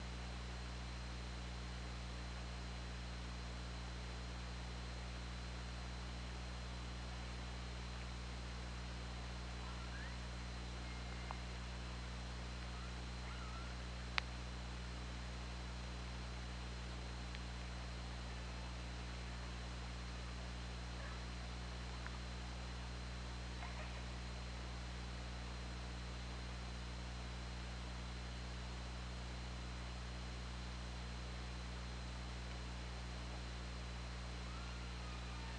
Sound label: OrcaLab